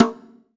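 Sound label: BONGO LOW, Drum Machines; EdgeSounds; Drum Mashines VOL-1; X-DRUMS KIT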